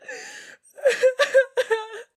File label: cry female, Voice Human